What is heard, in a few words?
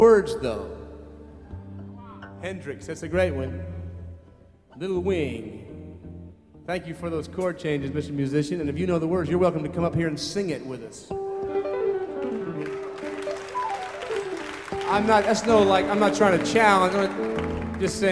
crowd